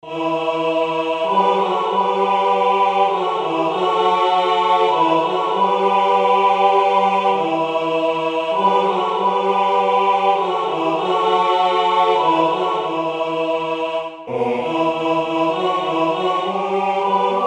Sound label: tutti